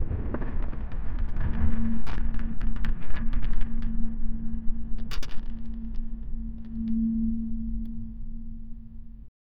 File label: kinetic sand cube droppin